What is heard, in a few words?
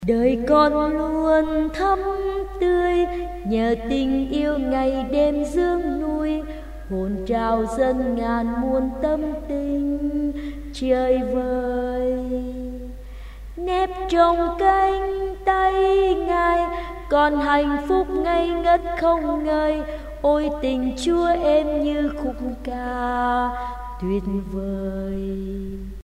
alto basso